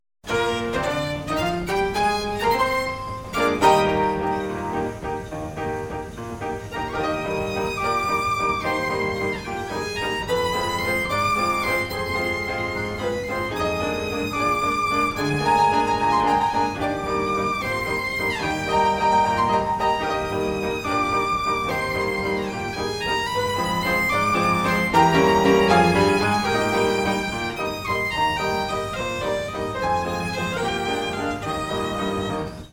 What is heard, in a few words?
Phonoliszt Violina Lotus Blumen Wals; geluidenquiz